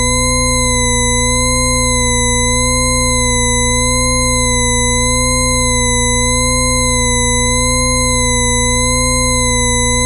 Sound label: sign multi swing